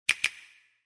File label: switch; cave